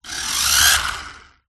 leisure radio controlled car accelerate short burst stop; zapsplat